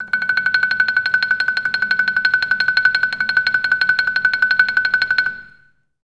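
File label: TREMOLO F#5, Orchestra; Best Service; Peter Siedlaczek's Advanced Orchestra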